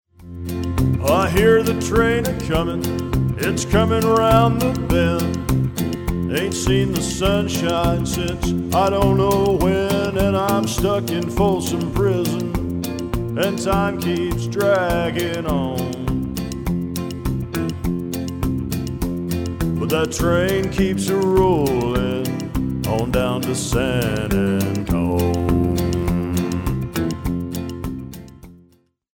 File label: Sings